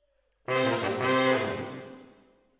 MKH AB, piano

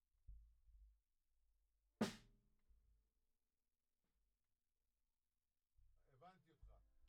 Kick

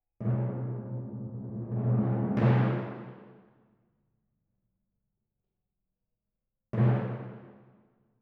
Timp

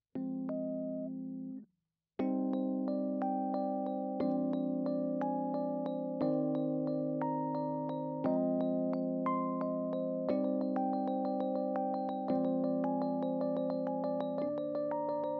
Rhodes

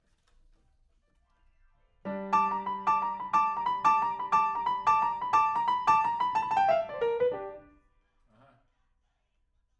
Piano, RNR for piano

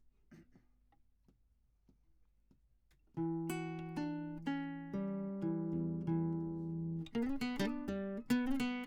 Classic Guitar

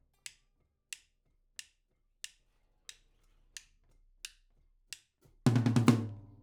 OHs

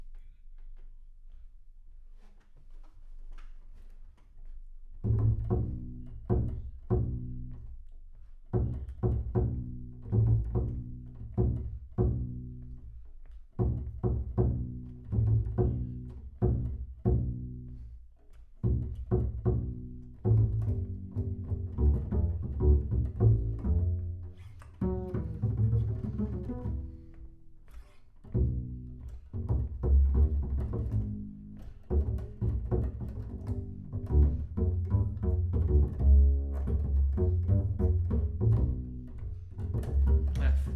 Bass Room

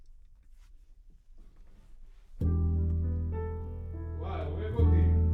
C Bass

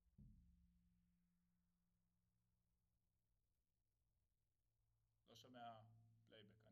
Tom

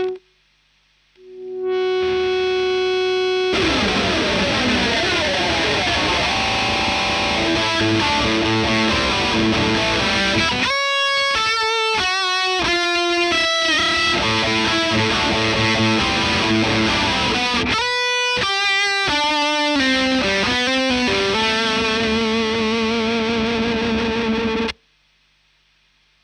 Marshal Gtr Line

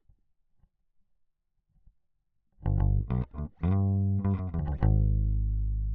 Bass

